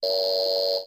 Button Buzzes Robot C

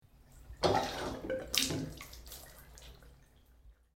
Creaky Water Faucet Squeaks And Drips

